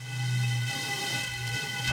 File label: bottle, ethno, 125